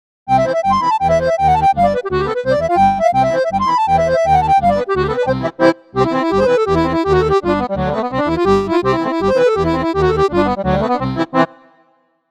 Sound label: Bassoon Speed Drill